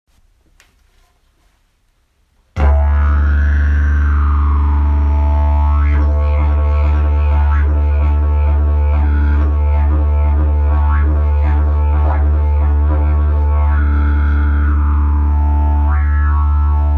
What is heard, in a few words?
jilm lecitel, didgeridoo